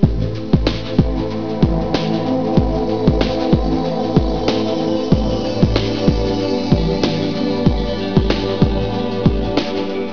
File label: SADENESS